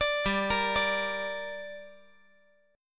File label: G Minor G down, chord